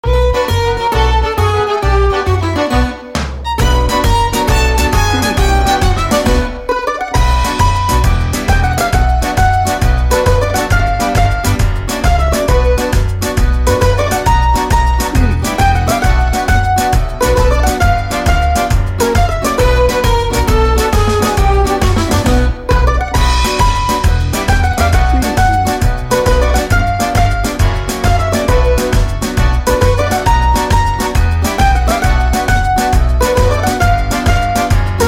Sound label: tarantella frenzy